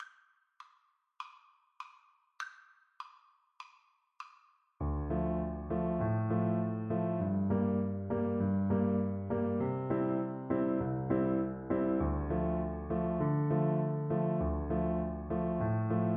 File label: Recorder